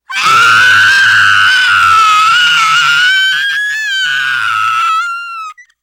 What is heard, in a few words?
death scream, teen girl; player; survivor; voice